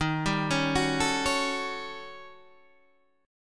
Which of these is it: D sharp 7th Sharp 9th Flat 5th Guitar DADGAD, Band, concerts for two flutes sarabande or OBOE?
D sharp 7th Sharp 9th Flat 5th Guitar DADGAD